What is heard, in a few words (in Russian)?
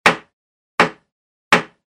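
деревянный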